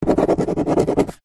office pen ball point write on empty cardboard box